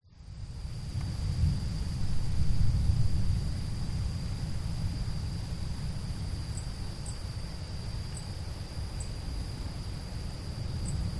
nature wind thunderstorm approach winds pick up strong